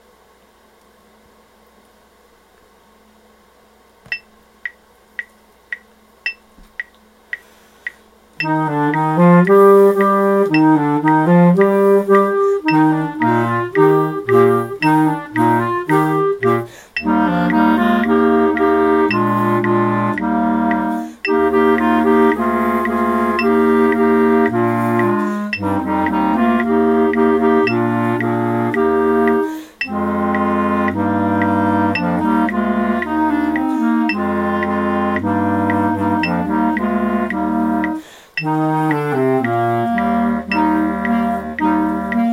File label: mungi ibariki afrika playalong